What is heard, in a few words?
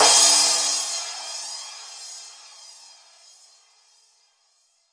prophet Crash, with Processing; Hip Hop; STYLE KITS (Hip Hop, Etc)